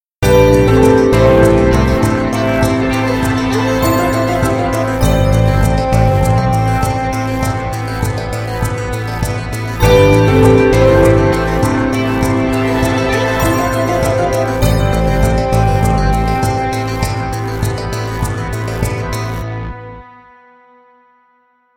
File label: Loop All Parts Mixed